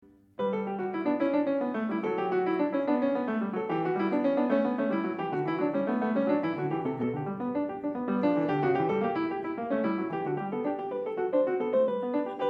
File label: Bach Goldberg Variations a 2 Clav, PIANISTS